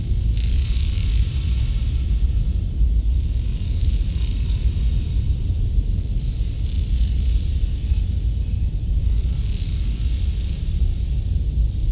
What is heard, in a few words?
cryo loop; Quake 4 Alpha; ambience cryo